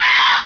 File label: BANSHEE